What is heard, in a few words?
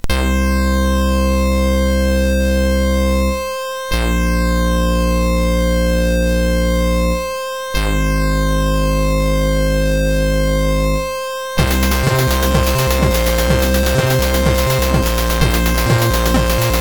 Remix sid MOS6581R4; Ocean Loader 4